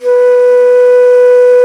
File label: BANSURI; E-MU Producer Series Vol. 7 – Old World Instruments